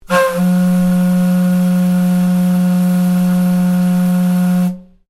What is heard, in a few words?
Ships Horn; Boat; Sounds onboard HMAS Castlemaine; Vehicles